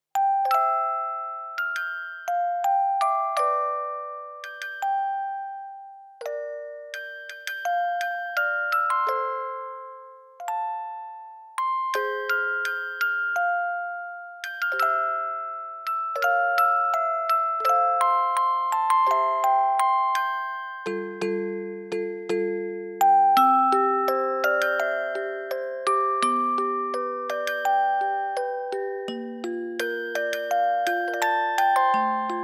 musical box ver.; Sa-Na-Ra-Ra R

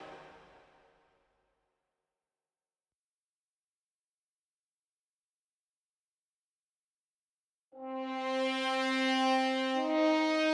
Cinematic Music - High Octane

